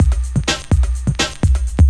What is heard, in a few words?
drum loops; bb drum loops